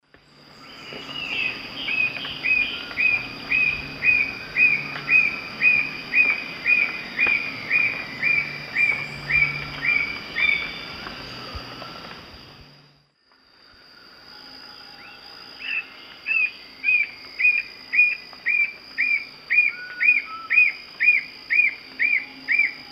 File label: Nonnula brunnea, song; S. America; Eastern Lowlands